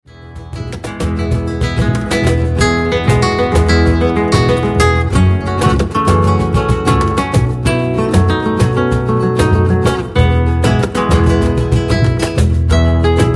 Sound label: World Band